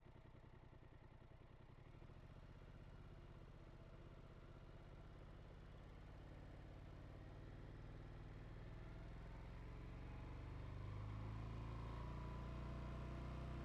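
Polaris Sportsman XP1000 ONBOARD RAMPS Drive Stop Off ENGINE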